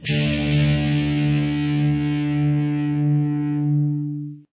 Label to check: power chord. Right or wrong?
Right